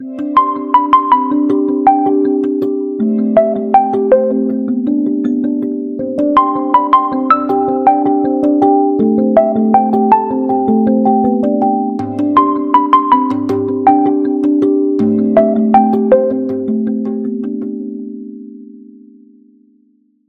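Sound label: delight, realme phone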